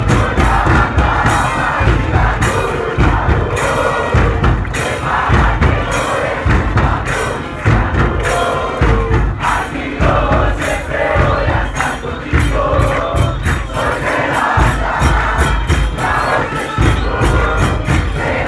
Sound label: "woah", fan chant